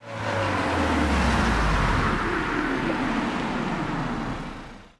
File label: car stop